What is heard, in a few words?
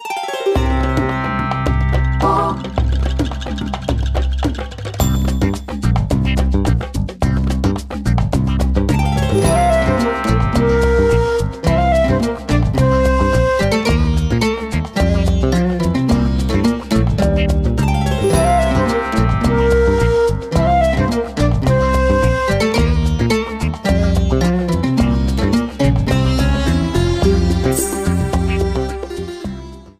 Desert Overworld; NSMBW; Coin World